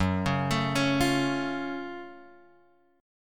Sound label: F sharp Minor Major 7th F sharp Guitar Drop D